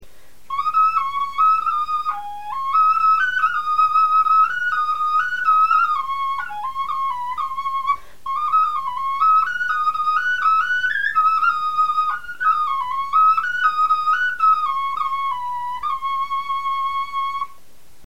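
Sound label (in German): melodie, Knochenflöten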